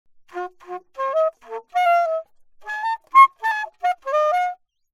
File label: altosax